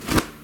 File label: Grab